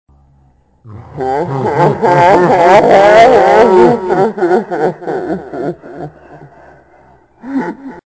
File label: evil laugh